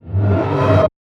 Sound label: horror dead arise swell; soundscrate